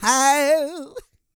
SING, Vocal Planet R&B & Dance; ILIO

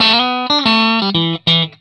RIFF 120, Vintage Blues Guitar; USB Soundscan vol.22

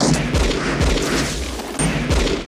SHOT SEQ; Total Control - Action Cutz

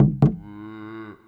WildFX, African; Quazibeat; African Secret; SFX

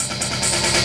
LOOP SD, 140 BPM; Ueberschall; Drum & Bass Resonance

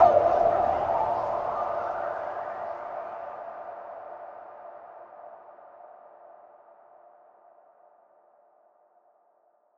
Impact

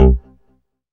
BASS, Synth Bass; BA1 Synth-Basses; Sound & Vision - Gigapack I CD 2

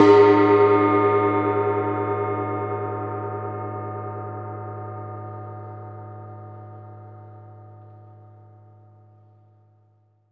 prc g#, ambi perc; Best Service ProSamples vol.36

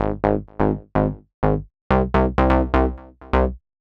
Bass, 126bpm, Nu Dance Electro; Zero-G - Soundclash; Frankfurt Zwei

